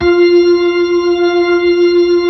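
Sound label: B-3, Hammond B-3 organ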